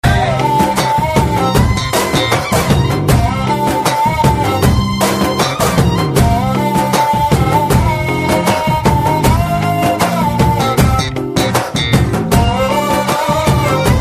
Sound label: Beat